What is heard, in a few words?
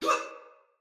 Pain, Male, en; Sinecure Banneret